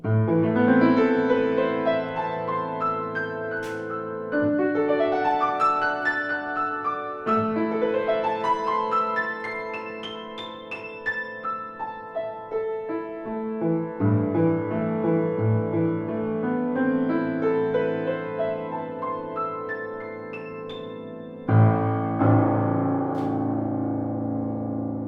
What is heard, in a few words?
Bluethner Kadenz a D a